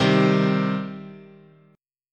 C Minor, guitar chord

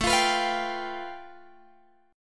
A 7th Suspended 2nd Sharp 5th, Guitar, DADGAD; 19,20,21,22,22,x

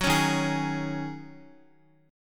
Eb Minor 11th Eb down Guitar 4F,4A,5C,5G,5C,6E; Ebm11 strummed; JGuitar

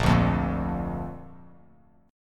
A sharp Major, down, Bass Standard; strummed; chord; JGuitar

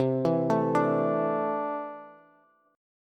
C 6th Add 9th C down Bass; arpeggiated; JGuitar

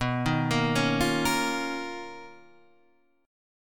B Major Sharp 11th, Guitar, Half Step Down; BM#11

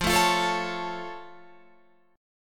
F Augmented F Guitar Open F; strummed

